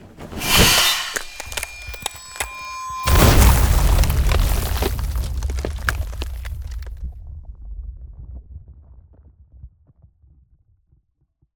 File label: Ice Spell Barrier Defense Glacial Barrier; Arctic Aura